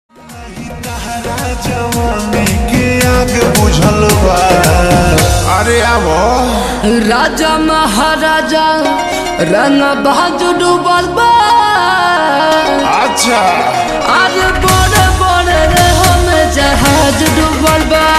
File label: Slowed Reverb Ringtone